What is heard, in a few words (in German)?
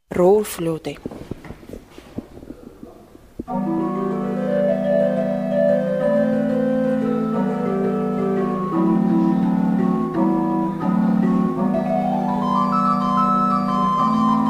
Rohrfloete, Pfeifen; Toene